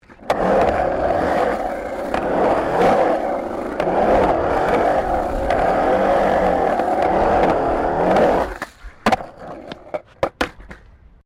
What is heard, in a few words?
SKATEBOARD ON THE BOARD SKATE BOWL; Skateboard sounds; Skateboarding; Sound Effects:Sports